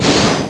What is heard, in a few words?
swing